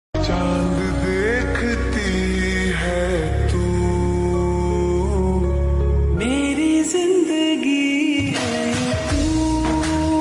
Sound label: Slow Reverb Version Ringtone